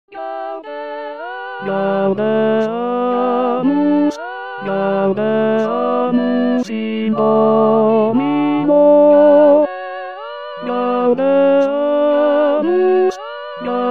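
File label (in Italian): gaudeamus omnes in domino tenore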